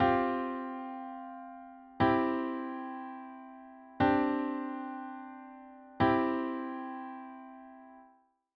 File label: triad Csus4 Csus2, chordtype triad